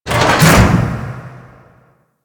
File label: Outpost Machine Barrel Drop; Warframe; Ambience; Corpus; Crp Outpost Defense Three